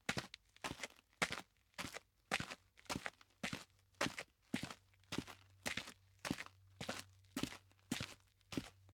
Footsteps Boots; LOOPERS

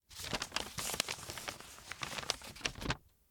Open A Paper; LOOPERS

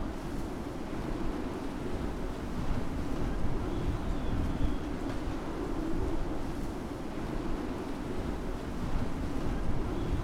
Strong Wind; LOOPERS

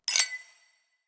Cheers; LOOPERS

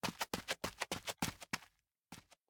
Footsteps; LOOPERS; Tyler

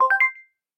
Inspire; LOOPERS

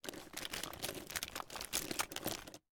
Looking For Treasures; LOOPERS

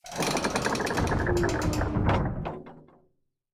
Squeak; LOOPERS

